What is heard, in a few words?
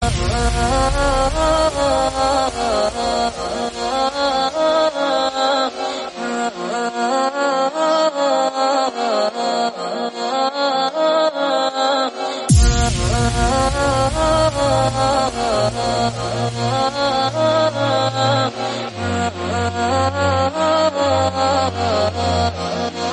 instrumental